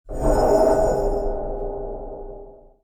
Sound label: Moved Dark